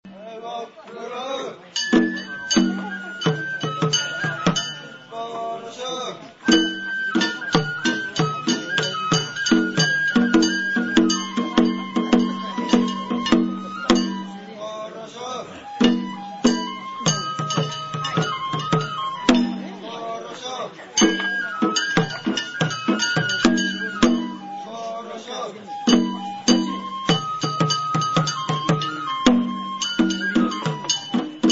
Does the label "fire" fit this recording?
No